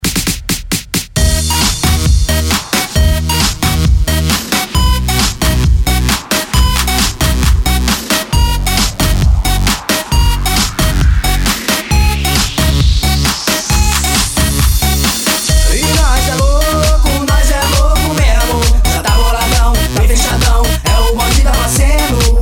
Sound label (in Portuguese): eletro e viola especial de eletro funk